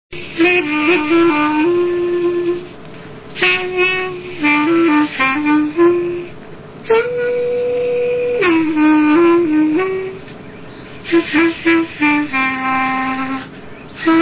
sopro

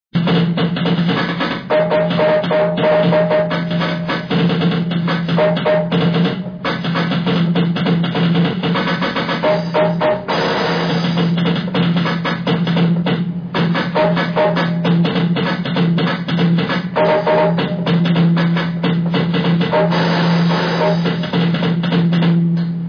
percussao

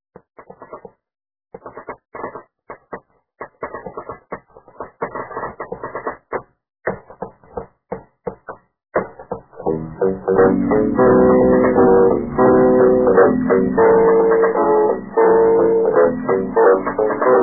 rapidinha

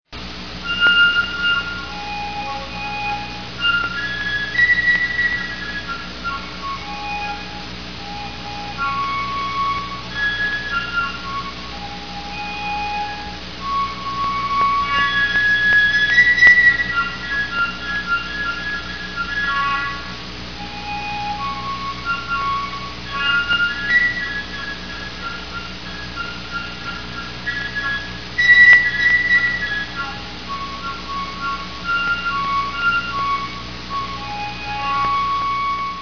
orgao

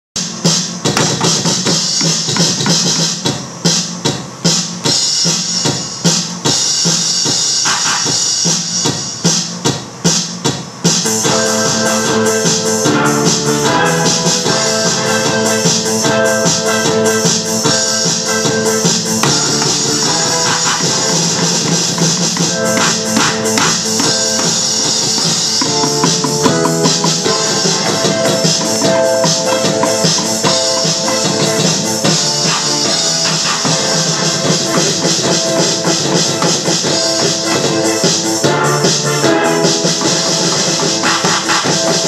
bat. elet.

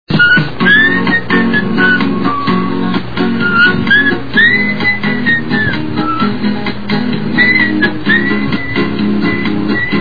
assoviada

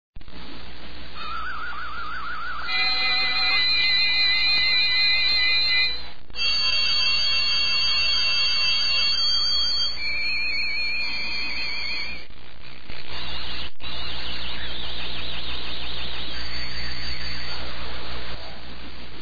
toque

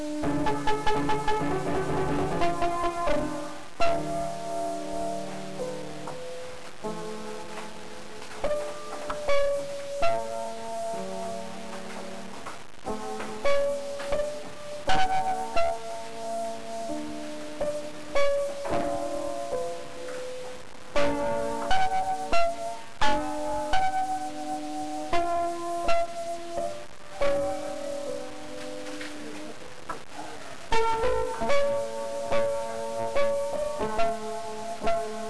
musica

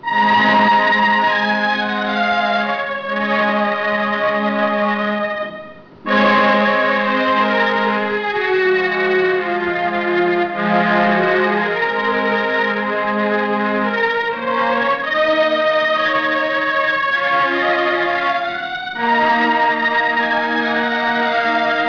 orgao

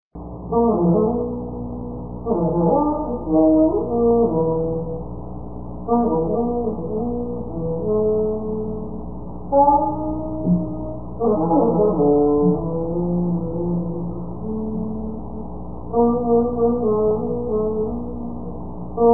sopro

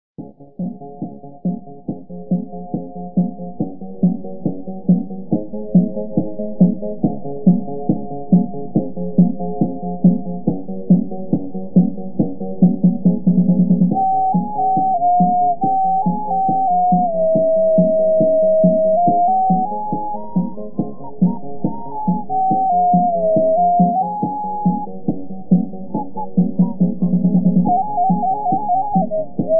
flauta

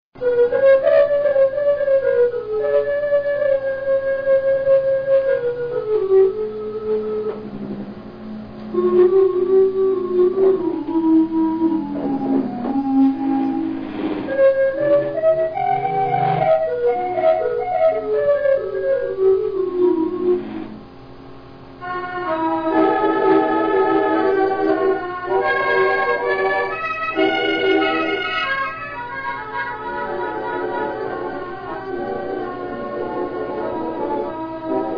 orgao

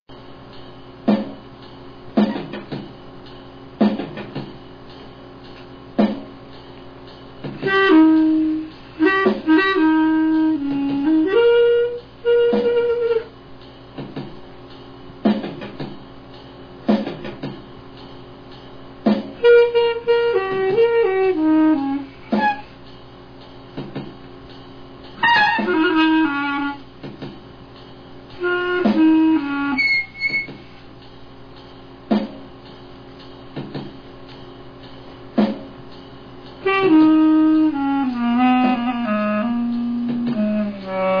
sopro

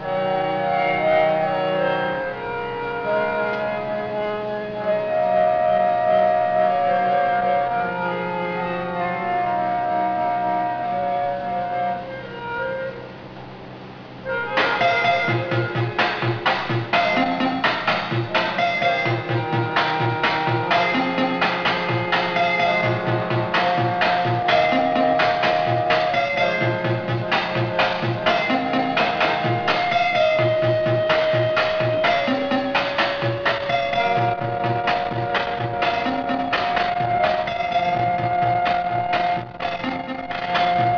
orgao

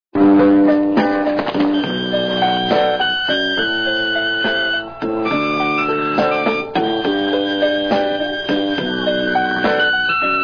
orgao

